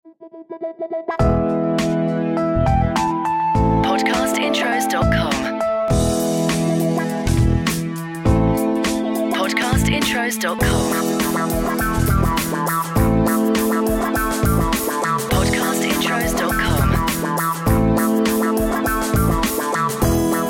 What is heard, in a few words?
CHILL OUT; Podcast Watermark